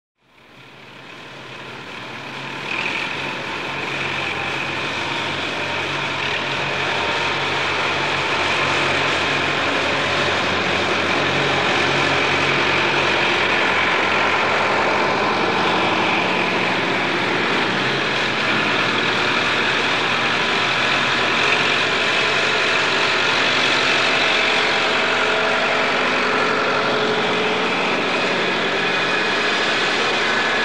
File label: Snow plow tractor; تراکتور برف روبی